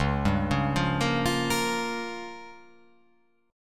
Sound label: Db 6th Flat 5th; Db6b5 arpeggiated; JGuitar